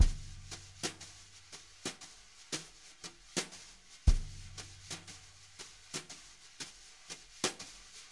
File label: jazz brush loops